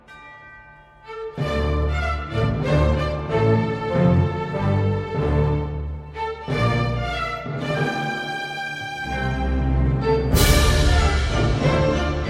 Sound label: Theme; Warhammer